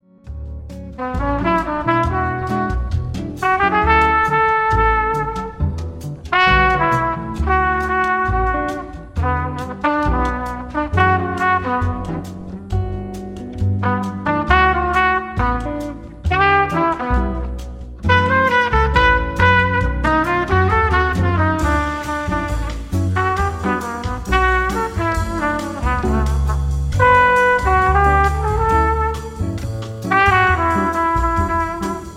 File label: nocturnal jazz melodies